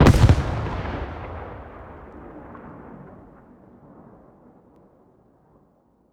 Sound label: med dyn, explosions; gmod; lvs framework